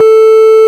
ORG, Farfisa Clarinet

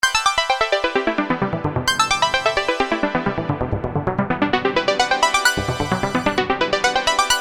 Arpeggio 130 A, Techno Synth Loops; Sonic Foundry (Sony Creative Software); Signals I

